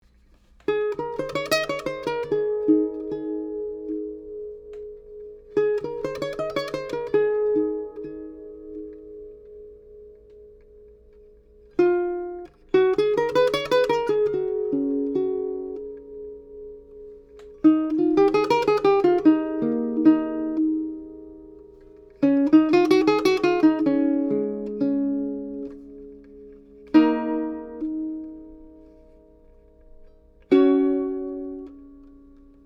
Resonance, Baroque Guitars; Mauchant; Mini K47 mics into a Trident 88 recording console, using Metric Halo ULN-8 converters

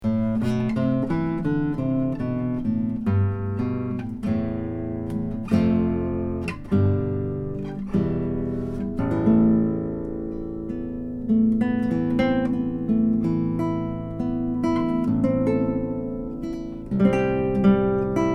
Wide Improv; Kohno; Improv (in the key of Am); Kohno08

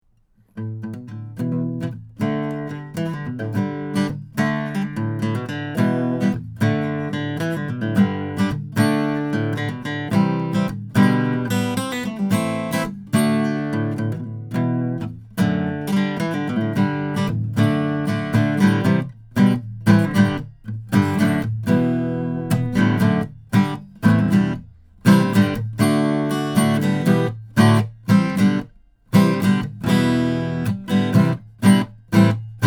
Lunar Melody, Gretch

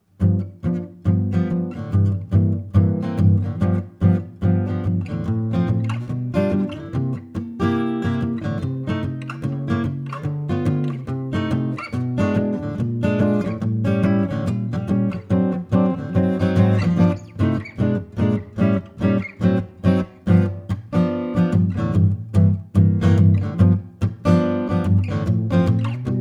Jazz; Cascade X15

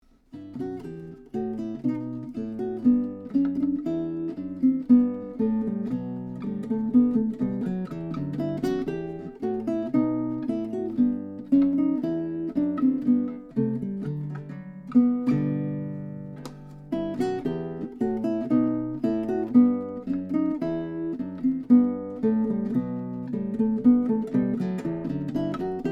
Bouree, Guitars; Baroque; Mazarand; Bouree in Em (J.S. Bach, Baroque)

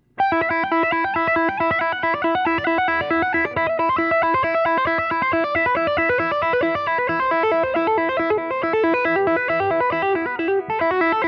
Knuckle Slurs; Cascade KnuckleHead

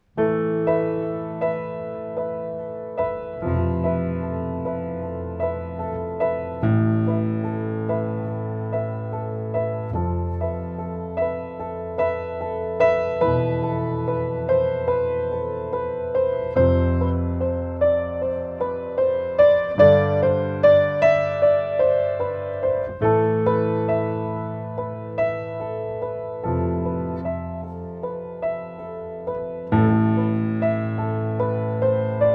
Piano, UM17; SHAFER GRAND PIANO; AMI UM17

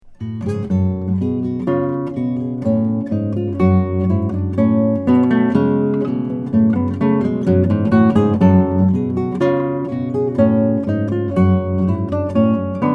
Bouree; Schoeps CMC341

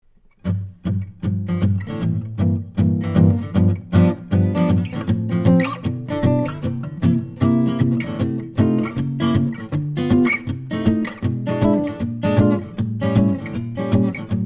Jazz

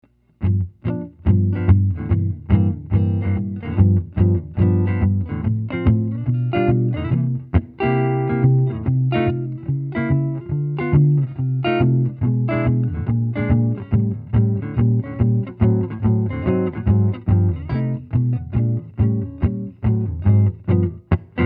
Knuckle Jazz; EASTMAN; DR. Z MAZ JR AMP; Cascade KnuckleHead

